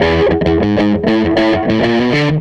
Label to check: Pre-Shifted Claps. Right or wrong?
Wrong